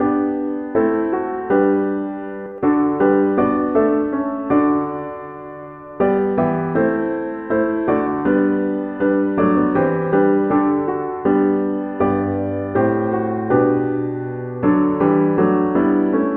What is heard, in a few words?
all my heart, piano